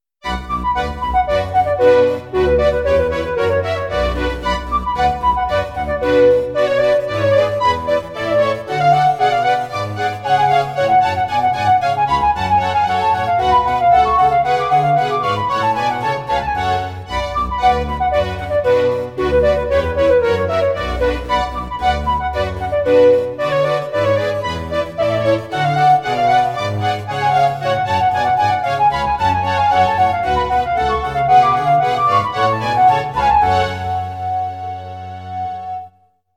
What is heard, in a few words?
DSI Mopho keyboard; Mopho x4 - imitazione strumenti acustici